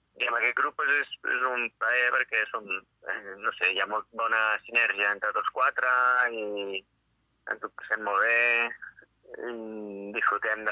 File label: quartet